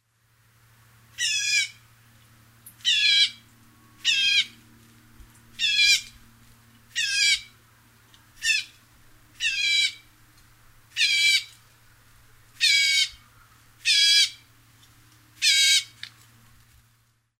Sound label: gray catbird